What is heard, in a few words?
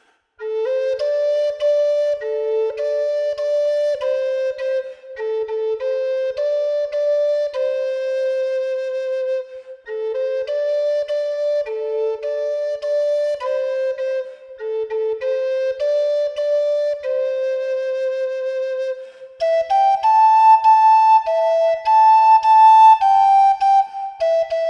MicMac Melody; First Membertou